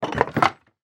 Material Wood Lever; Game Essentials

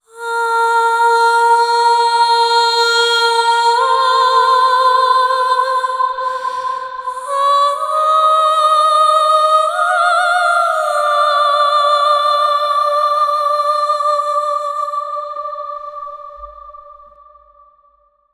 Top Line Vocals (WET); Top Line Vocals 2; Construction Kits; Cm 65Bpm; Ghosthack; SkyRealm; Kit 02 - Solomon Hill

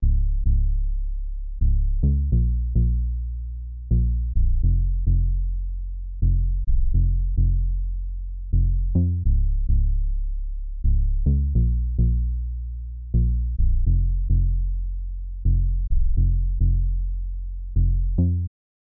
104 BPM F Min Bass, Latin; Loop Stems; Cymatics; Otro Dia

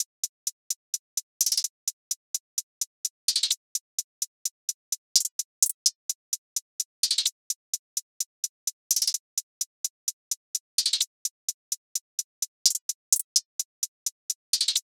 Hihat Loop - 128 BPM; trap; Drum Loops; Cymatics; Trap Starter Pack

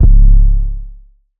808 - C#; 808s; Cymatics; Cobra Hip Hop Sample Pack

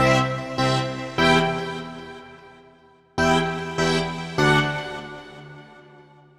chords, 150 BPM E Min; Stems; Cymatics; Space Party

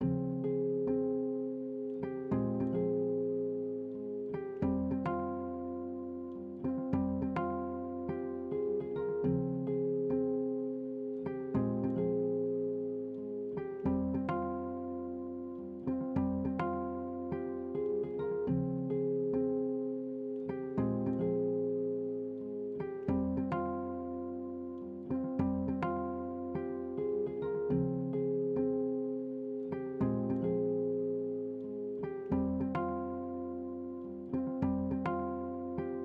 104 BPM F Min Guitar, Latin; Loop Stems; Cymatics; Otro Dia

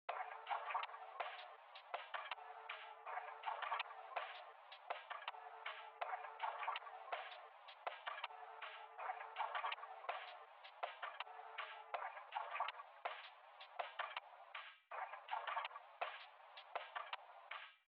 162 BPM C# Min Percussion; Melody; Melodies; Loop Stems; Cymatics; 2022 Melody Mystery Expansion; Feelings

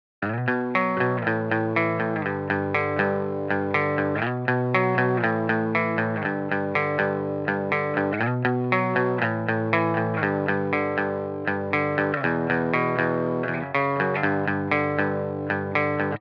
120 BPM B Min Guitar Harmonies; Loop Stems; Cymatics; Miami Guitar Pack - Pt 1; Ashes

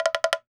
Jamblock, World Percussion; Cymatics; PHARAOH Beta Pack; Pharaoh Premium Drum Samples (BETA)

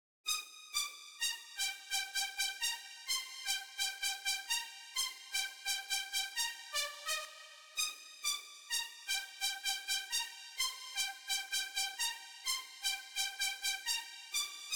128 BPM C Min Lead; House; Drop Loops; Cymatics; Golden Hour

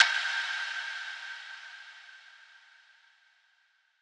Hiss Impact; House; FX; Impacts

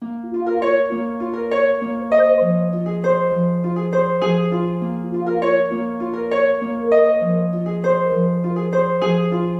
Various Melody Loop - 100 BPM A# Min; Cymatics; 2020 Melody Collection

